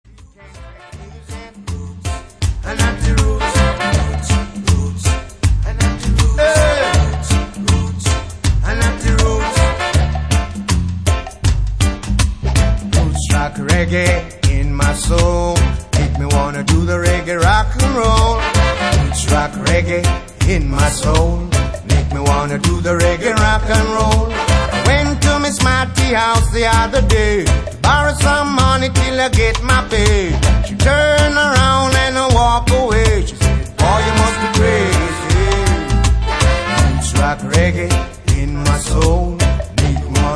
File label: Roots Rock Reggae